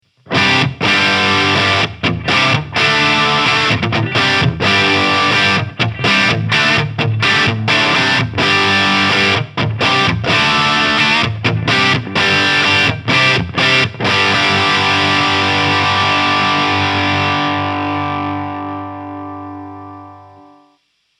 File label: Chapman Guitars Crunch Humbucker